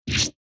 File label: Cut